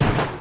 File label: wreck